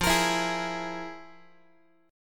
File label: F Minor Major 7th Double Flat 5th, Guitar; FmM7bb5; strummed; x,13,13,14,12,x